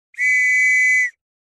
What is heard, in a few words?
police whistle